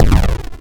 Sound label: explode